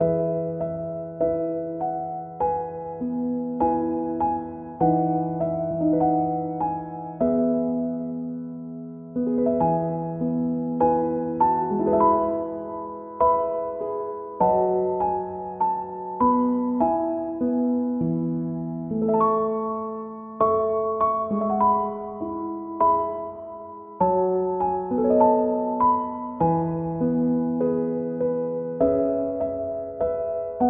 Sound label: Itsy Bitsy Spider (Piano)